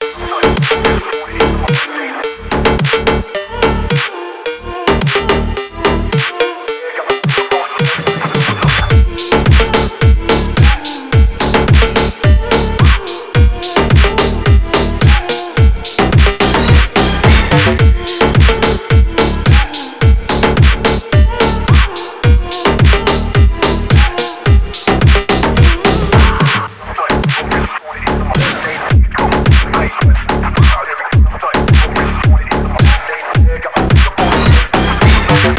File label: phonk drift slowed reverb